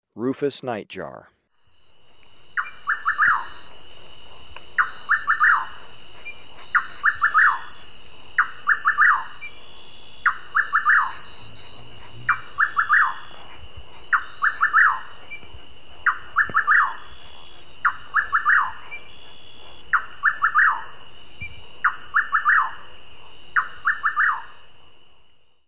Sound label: Rufous Nightjar, Birds of Ecuador; S. America